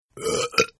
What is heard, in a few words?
Burp; Burping; Human; Partners In Rhyme